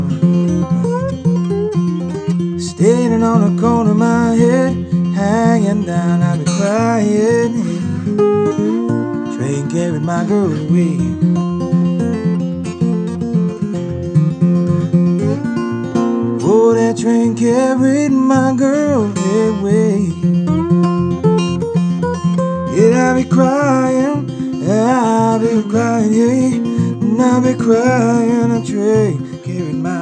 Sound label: blues